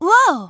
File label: "whoa"; pauline; SM64 CO-OP